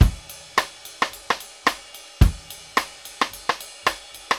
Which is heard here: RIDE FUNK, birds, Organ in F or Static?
RIDE FUNK